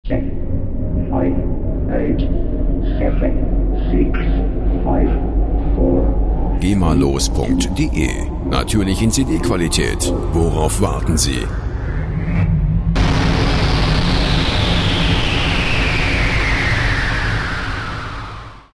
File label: countdown